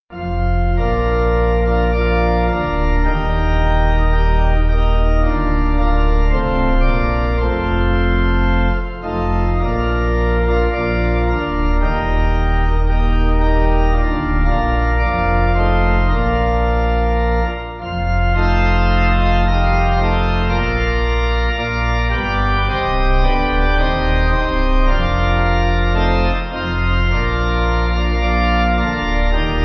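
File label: Bb Pipe Organ, Music Backing Files for Churches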